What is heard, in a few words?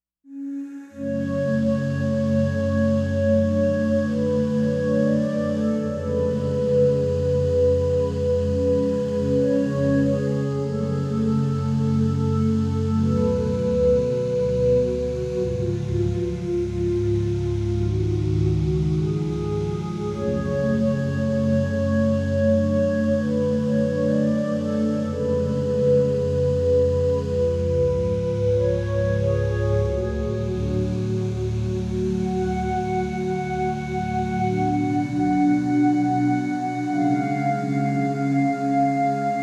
choir oohs; JUNO-Di Patch Demo